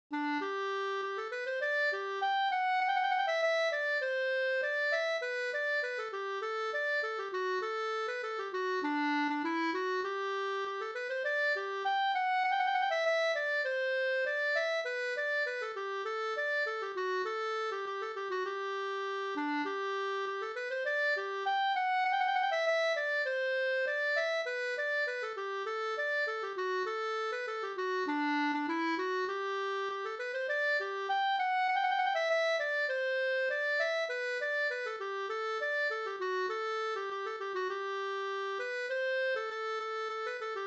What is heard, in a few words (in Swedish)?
snoa; Delsbo Hs